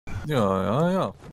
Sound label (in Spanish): jajaja